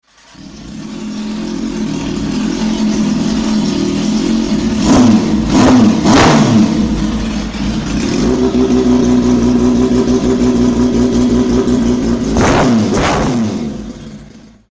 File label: zx10r